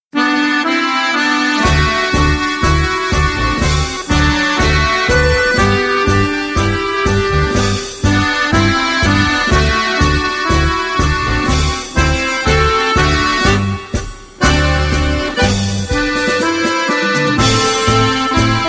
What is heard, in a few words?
piccolo tango